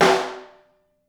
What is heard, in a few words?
SN2 Room, snare; Ableton Foundation FreePacks; Snare Designer